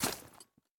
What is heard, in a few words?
Heavy Armor Grass Walking; Folk Hero